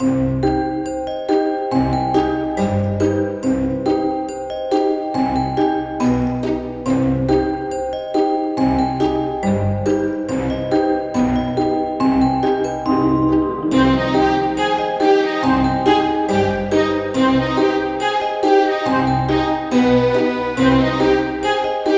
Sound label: celebration; Identity V